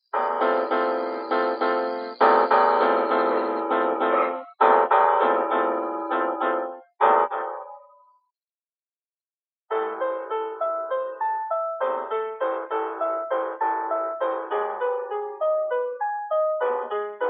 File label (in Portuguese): lembranças piano